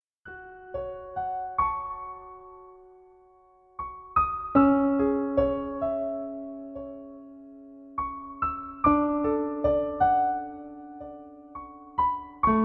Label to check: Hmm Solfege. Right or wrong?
Wrong